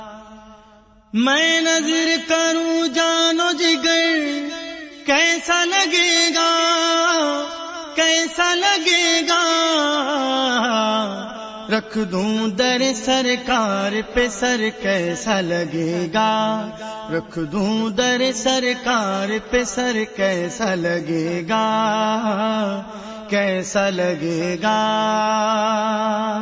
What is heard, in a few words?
Naat Ringtone